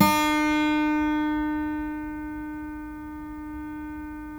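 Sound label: 12-string guitar; GUILD 12-STR; Guitars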